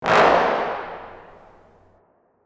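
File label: Sting Small Brass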